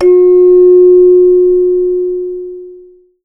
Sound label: hand chimes; Voice Crystal - Steve Reid Definitive Percussion